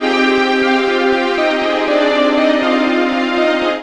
BAC, MUSICMOTIVES; Best Service ProSamples vol.49 - FX-Area; AKAI

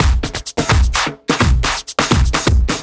Trip Swing Mix, 85bpm; off the grid

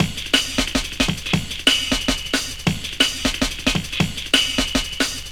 Runnin Break, Drum & Bass, Beats & Breaks; Fluid Dynamics

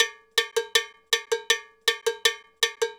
Cow Bell, Latin Percussion

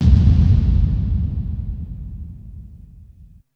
deep kick; BERZARRESOUNDZ

